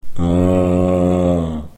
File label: zombie moan; Dark Village